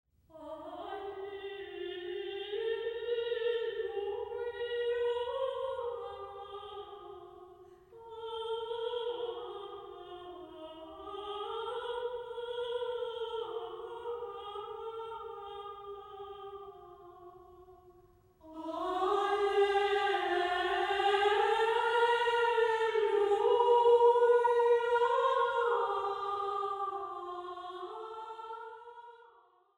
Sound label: Alleluia Dominus dixit, Chants of Christmas